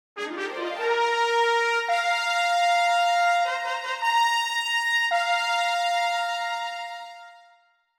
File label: Bold